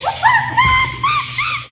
chimpanzee; Animals

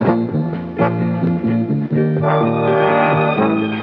horns, Phonky loops

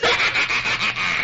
laugh evil

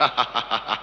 laugh

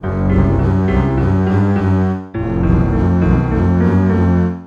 piano, Sad

